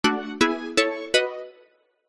multimedia game sound advance award nylon plucked synth